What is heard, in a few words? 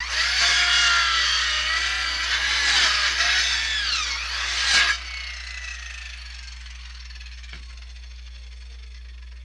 Circular Saw